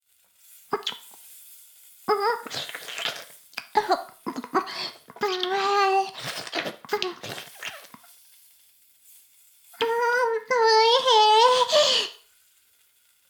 フェラ音声; 古き城のドラゴニート2

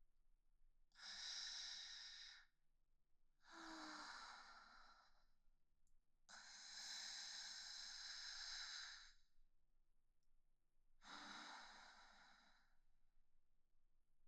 寝息・彼氏と一緒に穏やかに眠っている彼女; 明るい少女系カノジョ

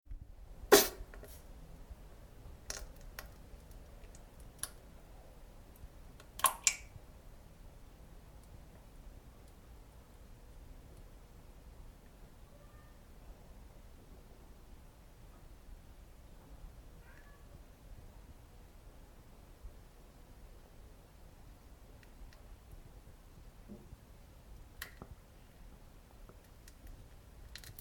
普通うんこ おなら なかなか出なくて 間が長い

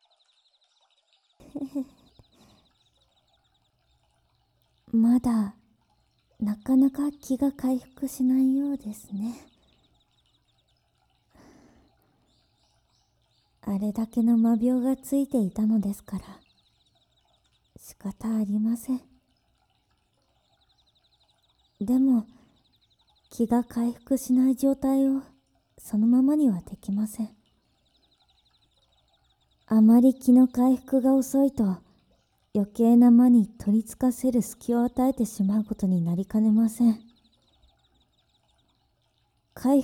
授乳・耳舐め

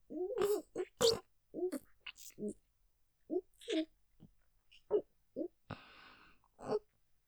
鼻で息しながら味わう感じで・もぐもぐ咀嚼; 追い込み６; 射精余韻; ステレオ; ループで楽しむ！シェフの気まぐれ抜き抜きコース

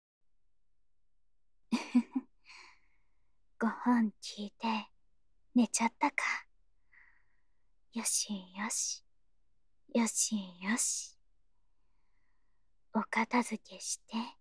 声のみ 寝息とぽんぽん, ASMR; 特典音声

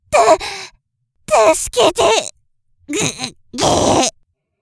首絞め風

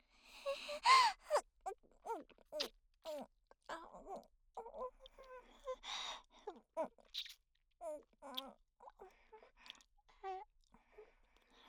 イラマチオ・抵抗しながらも恐怖に怯えながら男性器をぺろぺろと舐めている声; ストレートお姉さん系カノジョ

